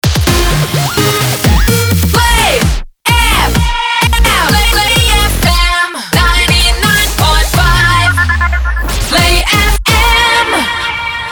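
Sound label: 128bpm Bbmin MIX Main ID, jingle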